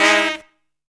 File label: FINALE HIT, Funky Elements, HITS STABS; Zero-G